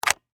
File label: Foley, Switch, Slide, Click, Plastic, Toy; Switch Sound Effects; BlastWave